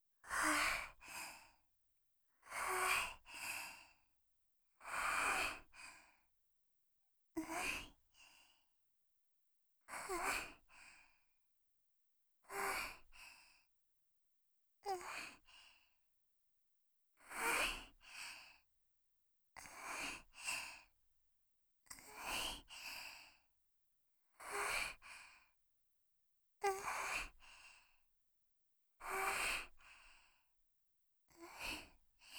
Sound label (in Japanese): 吐息